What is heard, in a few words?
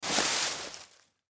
Leaves Movement, Leaves Movement Foliage; Forests And Jungle Sound Effects; Backgrounds; Foley; Blastwave